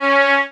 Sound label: violin